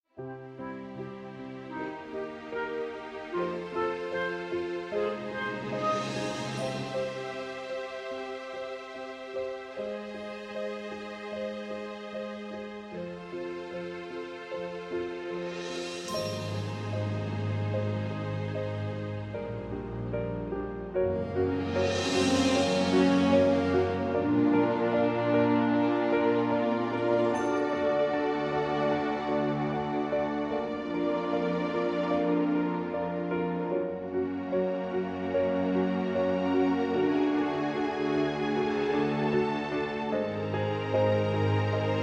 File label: Cartoon, film music